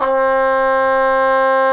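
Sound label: brass cup mute trumpet, dry; Roland LCDP12 Solo Brass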